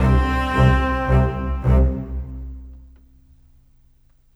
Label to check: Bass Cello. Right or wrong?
Right